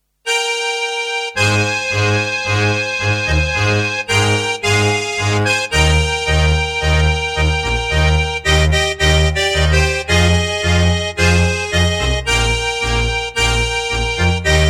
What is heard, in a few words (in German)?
Tango